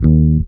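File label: bell, Roots Disco; USB Soundscan vol.32; AKAI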